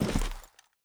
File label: body impact soft; physics